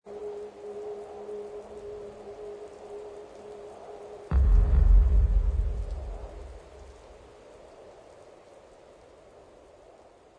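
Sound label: instrumental, backing track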